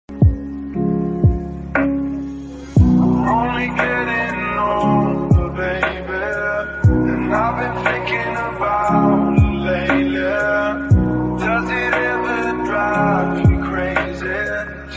Lofi Ringtone

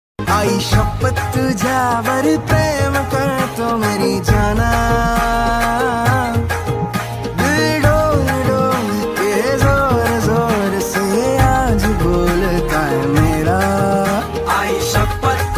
Marathi Song Ringtone

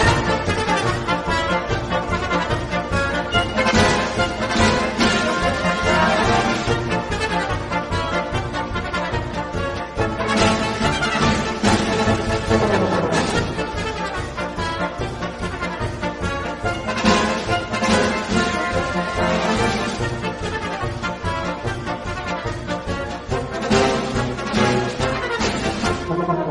Circus Music; ringtones

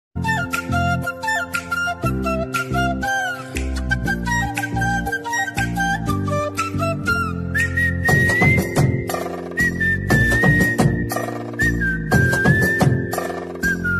Flute Music Ringtone; ringtones

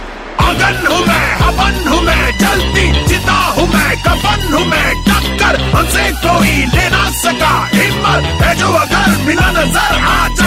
Song Ringtone

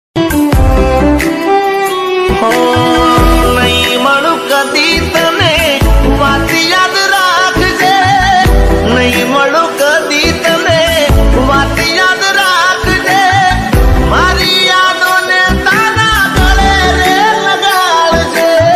Gujarati Ringtone

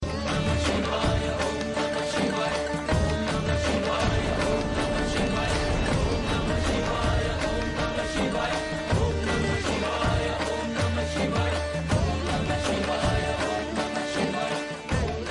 Om Namah Shivay Tune, Bhakti Ringtone

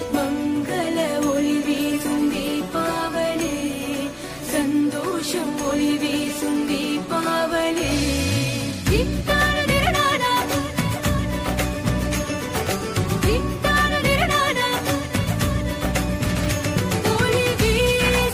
Deepavali Song Ringtone

